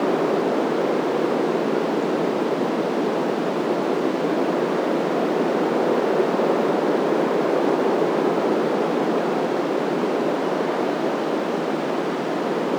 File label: Wind medium; ambient; Project Gotham Racing